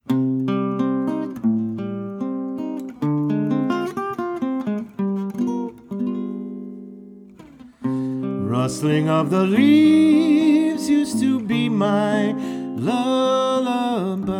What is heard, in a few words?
Remix